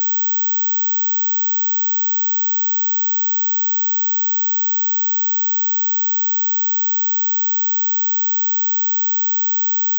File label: anti mosquitos 23kH